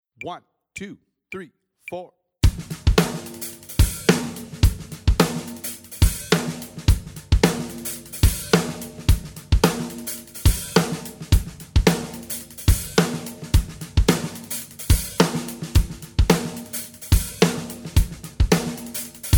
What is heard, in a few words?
Hip-Hop Sixteenth Triplets